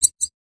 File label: 3D Storage Room rat; ambience; ninjago